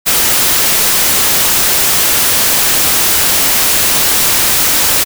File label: noise